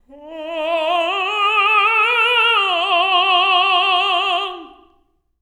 LYRICAL; Spectrasonics; Symphony of Voices

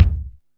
XPANDO, KICKS; Back In Time Records - Big Bang

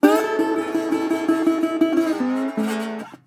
120, Blues Guitar; ILIO - Fretworks

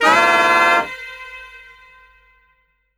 SAX, 150 BPM; Ueberschall; Drum & Bass Resonance

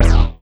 Synth Hit E

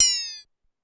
Triangle Down, Pitch Env; Clavia DDrum-AT; Pitch Env Various

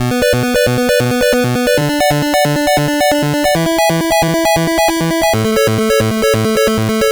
Game Boy Synth; 8-Bit; Chiptune

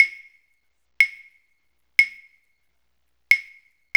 93 CHA, Cha Cha Cha, African Contemporary; Spectrasonics; Supreme Beats

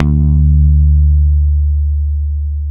PICK D 3, Bass; Spectrasonics - Bass Legends CD 2

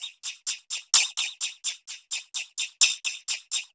TAMB; Zero-G; Chemical Beats

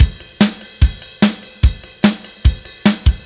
Beat, Indie Rock; Silos; Sonic Foundry (Sony Creative Software); Indie Rock Anthems; Session 11 - Silos

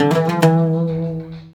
World Lick

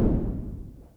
SYNCUSSION

